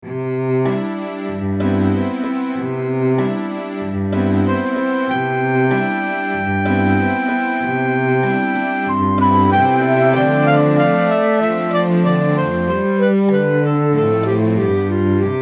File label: inspirational